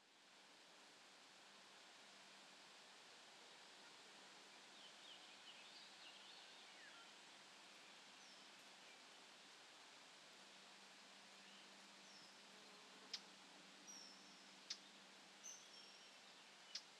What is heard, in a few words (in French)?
Très Ancienne Carrière Lac Oiseaux Discussion Enfant Vent Plouf